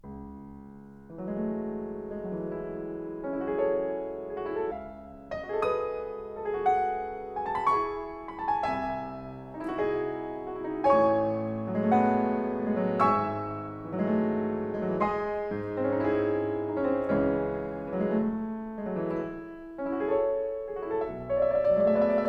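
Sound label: Symphonic Etude, piano; Shura Cherkassky; Schumann; Etude VIII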